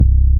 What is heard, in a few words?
DM BAS, Dance Mega Synth